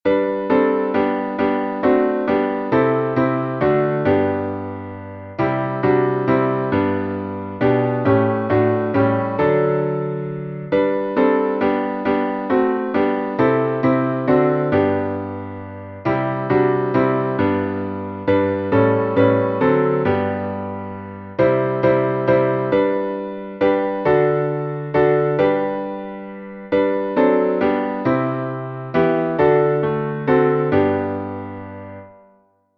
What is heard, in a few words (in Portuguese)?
salmo instrumental